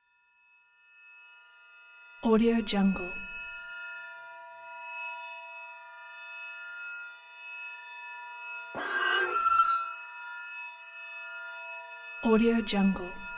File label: orbiting energy low tones and scrapes